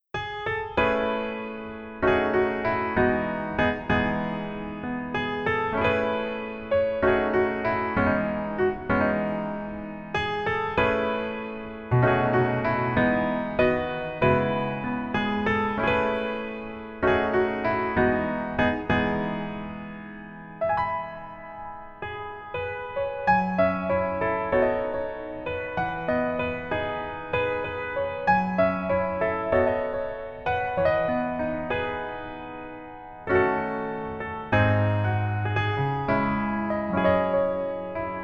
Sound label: Piano ver, 멜로디MR